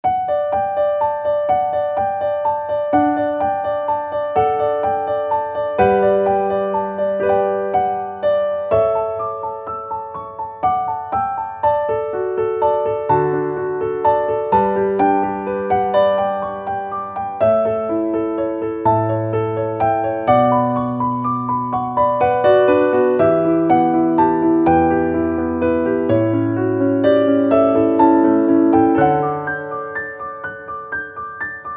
piano; しっとり 流麗 夜空; 広大な夜空と流れる星の光。小さなことは忘れて明日からまた頑張りましょう。

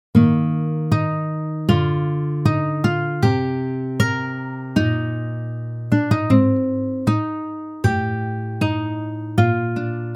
guitar; ギター協奏曲 アレンジ; しっとりゆるやかなギターアレンジ。

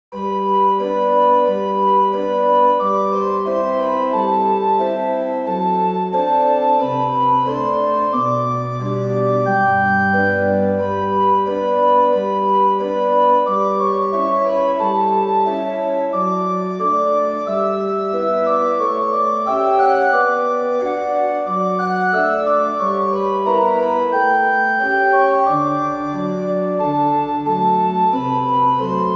organ; schubert

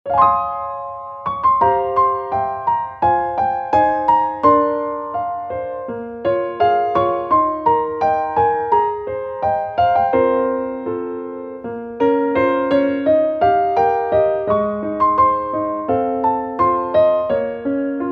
piano; きらめき メロディアス バラード; 出会った瞬間、一筋の光のようなきらめきがほとばしる。

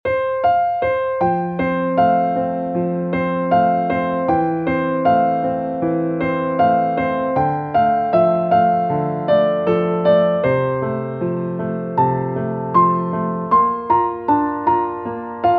piano

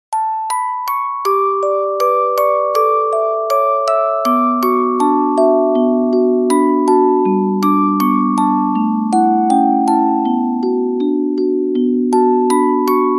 orgel

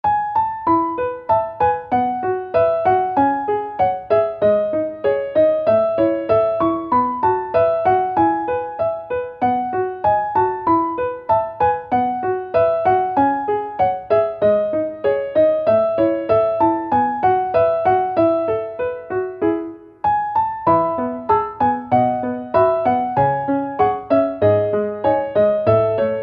piano; 軽やか 日常 朝 作業; 同じ毎朝を楽しめる幸せ。ゆったりスタッカートが心地よいピアノ。

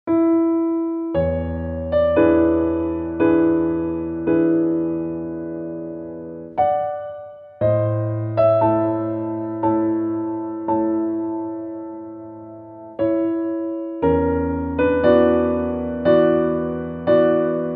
prelude piano; chopin; 前奏曲第７番; ショパン

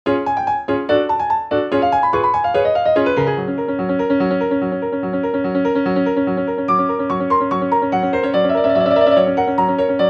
piano; 疾走 急ぎ コミカル

